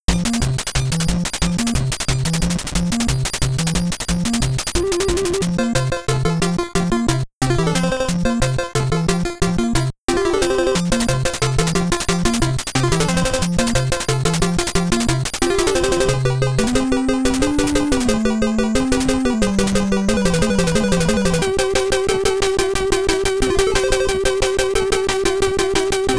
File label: Quirky